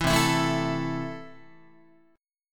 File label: C 6th C down Guitar; C6 strummed; 7,8,7,7,8,8